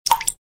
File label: loud drop